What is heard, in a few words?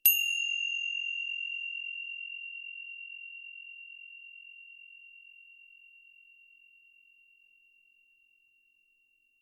tibcymb distant weak sound